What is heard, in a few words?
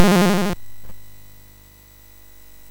Dragons Den, MOS6581R3